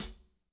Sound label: HAT, WARM+FAT KIT; Spectrasonics - Burning Grooves CD2